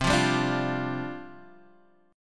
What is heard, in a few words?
G 7th Suspended 4th C